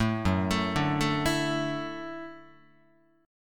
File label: Gb Minor 7th Sharp 5th, Guitar; arpeggiated; JGuitar